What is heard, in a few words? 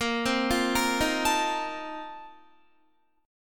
Bb Major 7th Suspended 2nd Suspended 4th, Guitar; arpeggiated